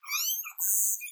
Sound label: Chirp zap chirp